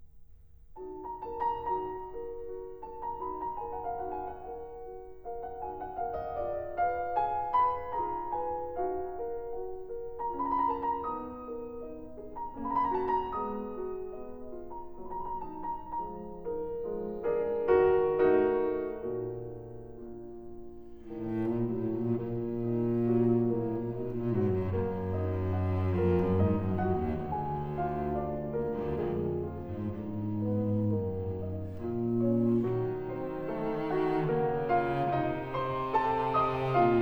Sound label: Variations for cello & piano in E flat major